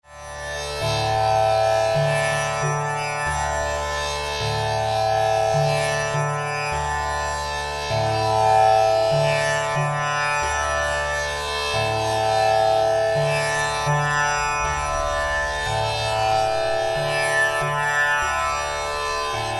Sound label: Tambura Bamboo D; Yogeswaran